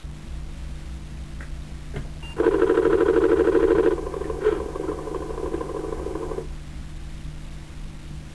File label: Disk II startup, Noises; A2